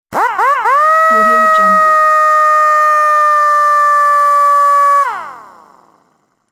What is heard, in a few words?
impact wrench revs and runs dry